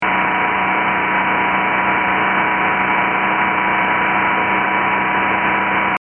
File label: fastspin